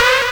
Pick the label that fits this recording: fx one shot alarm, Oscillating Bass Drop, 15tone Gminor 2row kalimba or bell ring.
fx one shot alarm